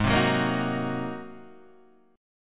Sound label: G sharp 9th G sharp; G#9 Chord {4 3 4 3 4 2} strummed